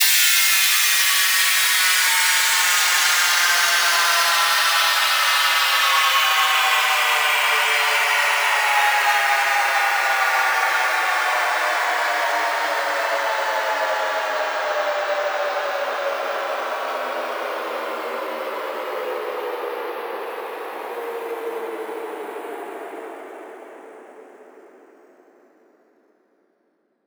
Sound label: fx downlifter 128; Virtual Riot; 16bar; mayday